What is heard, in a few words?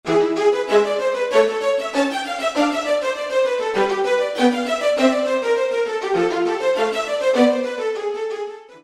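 Marcato Violins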